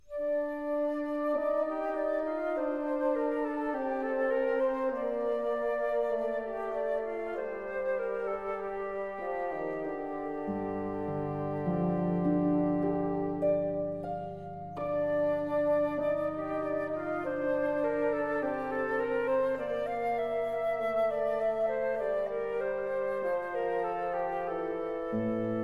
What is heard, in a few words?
music for bassoon; french